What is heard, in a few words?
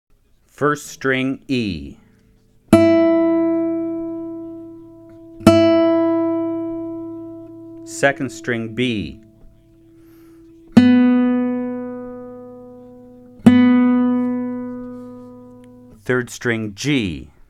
Tuning, guitar